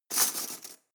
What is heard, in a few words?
cutlery teaspoons drawer grab hard